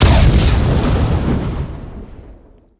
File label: explosion medium; Emperor - Battle for Dune